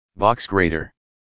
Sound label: Box Grater